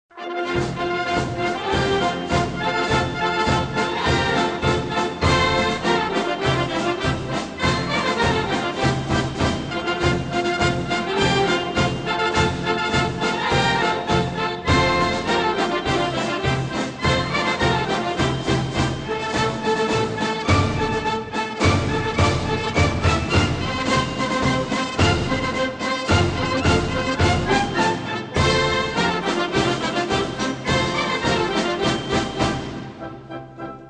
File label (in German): Marsch; Yorckscher